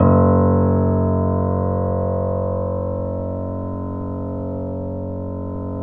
F#, The Piano

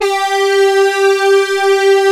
SYN, Analog; Sound & Vision; Gigapack I CD 2

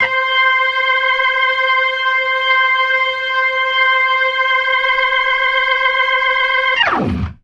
GLISS+SPIN, ORGAN RIFFS; InVision Interactive; Keith Emerson

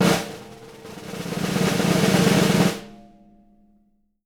snare roll, JZ XSNARES; Sampleheads; Peter Erskine Living Drums

